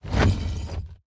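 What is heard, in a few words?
movement armor heavy; smite; god atlas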